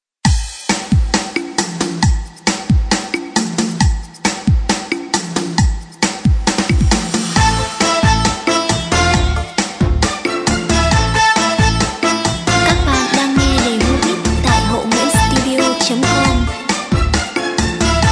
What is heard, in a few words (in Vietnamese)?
beat